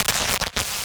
Glitches; Antidote Advent

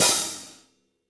hihat - opened; JazzFunkKit

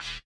BUZZER; Spectrasonics; Metamorphosis

